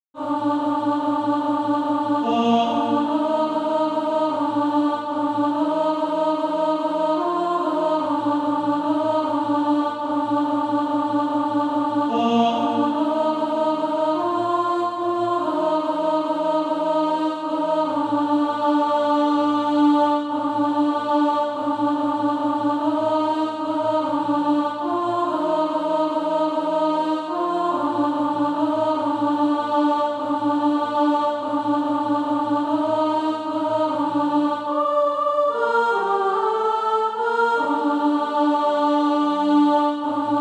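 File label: alto, Practise Music; SATB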